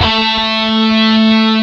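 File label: DIST GT3 A2, distorted guitar; DIST GUITAR3; AKAI S-Series CD-ROM Sound Library VOL-7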